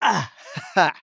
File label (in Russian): Смех; Лусио